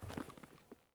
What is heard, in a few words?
gear stereo; Escape from Tarkov